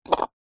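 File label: sport golf ball knock into hole cup; zapsplat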